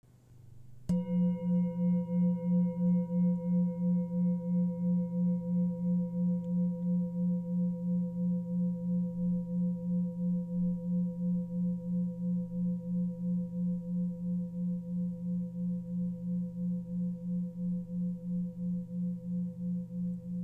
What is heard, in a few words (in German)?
Buddhas Augen Klangprobe